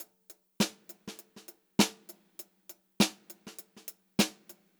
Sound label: Funk Groove Drumloops; USB Soundscan vol.38; AKAI